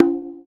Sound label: Bongo; Percussion; Vengeance Samples